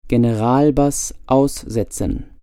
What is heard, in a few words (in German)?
Generalbass